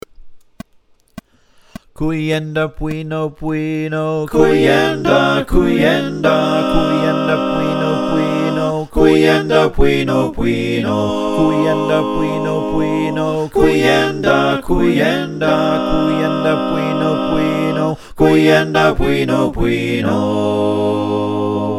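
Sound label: soprano alto tenor bass, choir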